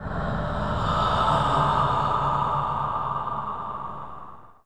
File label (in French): Souffle